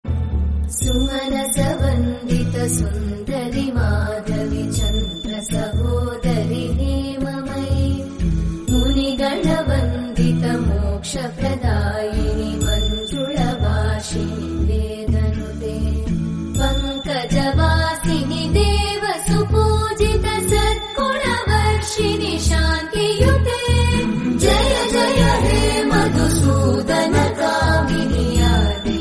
sacred chants of mahalakshmi, lakshmi devi stotram